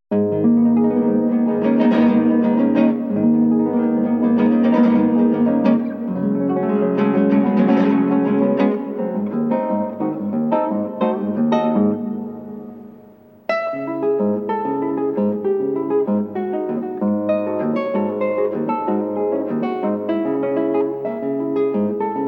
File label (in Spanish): Merengue Venezolano